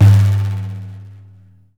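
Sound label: bendir open; Deff Bendir &Req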